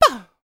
yell, male human, R&B dance vocal; Spectrasonics Vocal Planet

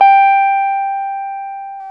GUI SUS G 4, E-Guitar; E-GUITARS

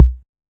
Kick, 606; classic machines

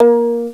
VLA PIZZ C, Fairlight CMI, STRINGS; STRINGS3

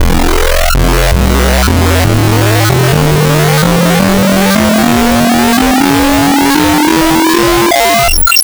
Modulo Sine, wavetables; Architecture 32

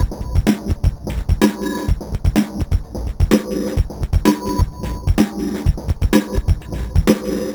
Gated fx 127, Beat Loops; Zero-G; Monster Beats

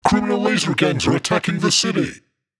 VOX FX; neuro; Stanza

